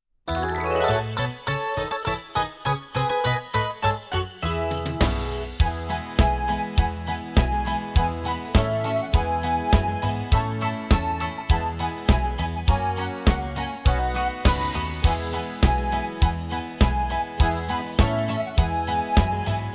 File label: Jingle Bells Jingle Song Instrumental